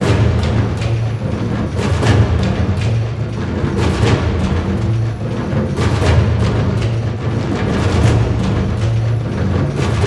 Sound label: Tribal Ethno Drums Drum Drone Experimental Action Modern Cinematic Music